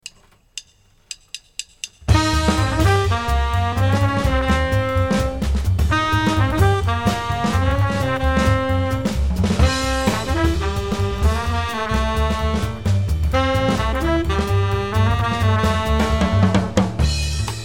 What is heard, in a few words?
minus Piano